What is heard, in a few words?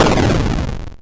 explosion, Retro Sounds; Kenney's Sound Pack; Retro Sounds 2